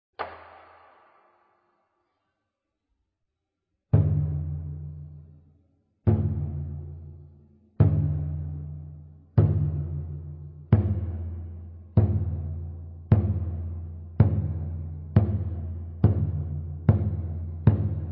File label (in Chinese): 一通鼓; 初獻禮前